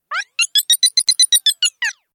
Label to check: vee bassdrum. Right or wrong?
Wrong